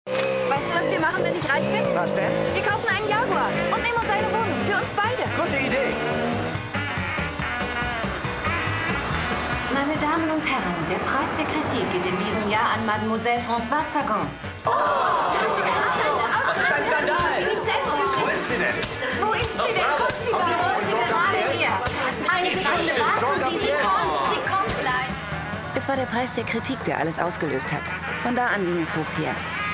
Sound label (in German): Rollerfahrt mit Bruder